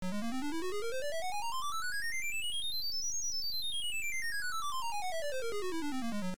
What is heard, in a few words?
Title Beeper; Stifflip & Co